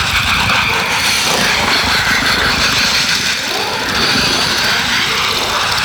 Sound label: Reap Proj Loop; Wraith